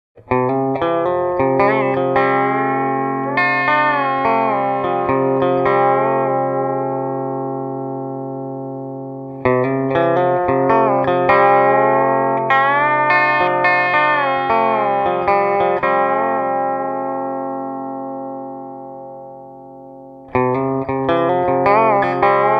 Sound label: Pedal Steel like Bends